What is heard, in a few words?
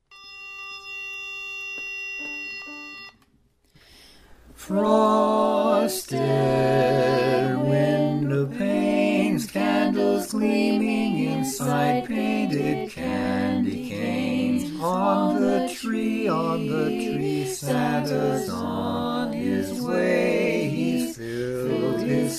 bass